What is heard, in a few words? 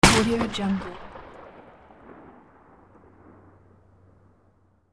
gun blast outdoor long decay